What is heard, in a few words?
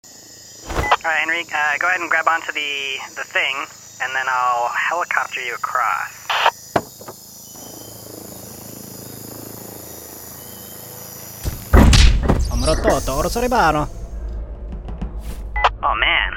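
Trapeze; The Henry Stickmin Collection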